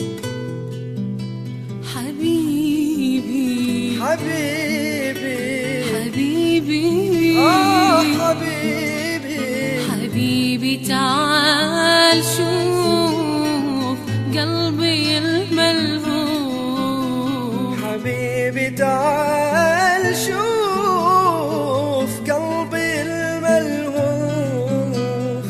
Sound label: Guitara